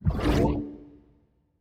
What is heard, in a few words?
Training Ring Player Through; Sports